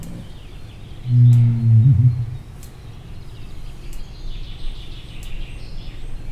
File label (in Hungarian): távolabbról, nandu; pecsi zoo 2017